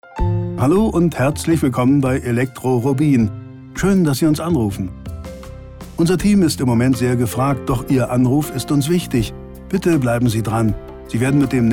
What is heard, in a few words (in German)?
Elektro Warteschleife